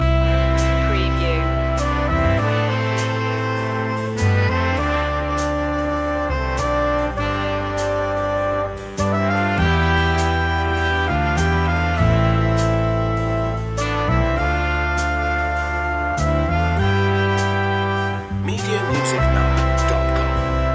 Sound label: big band romance loop joiner